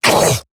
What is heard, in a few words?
Pain Eximus Grineer Male; Battle Barks